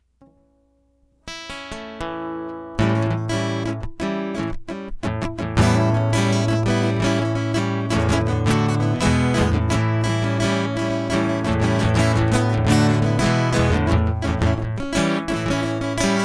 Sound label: Instrumental